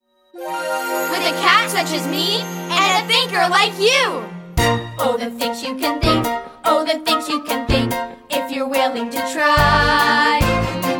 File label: Vocals; Cat, Thing 1, 2